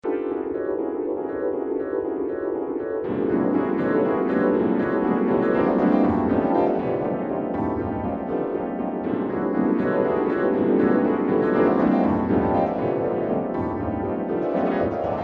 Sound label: Variations On Waltz; Chopin